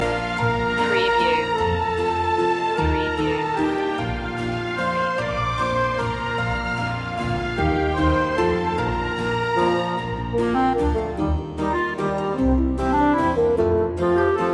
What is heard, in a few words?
we wish you a merry christmas orch loop; media music now